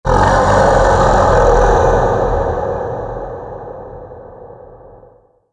danger growl ambient

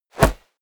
basketball hit basket

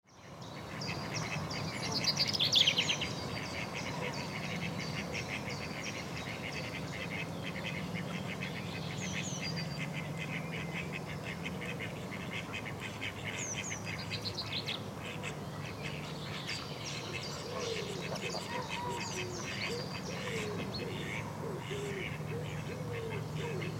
ducks and birds near water

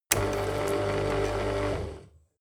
shredder auto

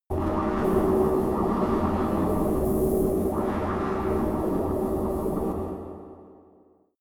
space inside biomedical alien power plant

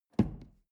drawer medium close lock

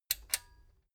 metal button stereo click disable slow

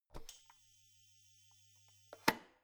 oven turning on induction

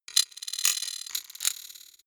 sci-fi electromechanics small stutter tonal

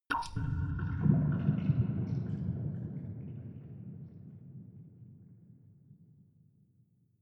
dripping

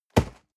box hit hard rustle

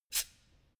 pneumatic pump soft short

